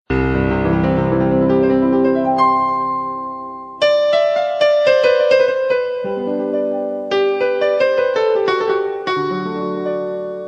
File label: Instrumentals